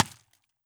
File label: impact squeeky, Cartoon Film Effects